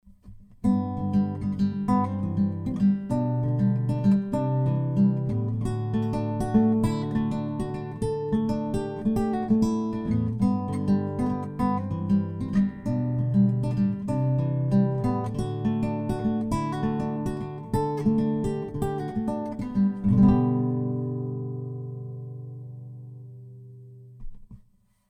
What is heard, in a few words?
Fishman Aura Spectrum Nylon Picking Blend Comp; LaMancha Rubi